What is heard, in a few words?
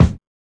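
BD, bass drum; X-Static Goldmine 1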